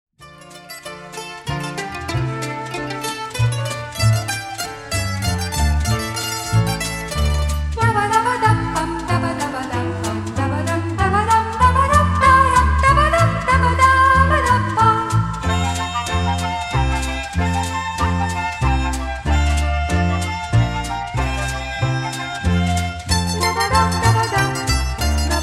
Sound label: two hornpipes